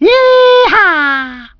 Powerup Bomb; Master Blaster 2001

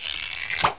door open

